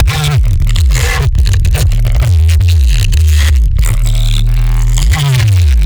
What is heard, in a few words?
neuro bass d sharp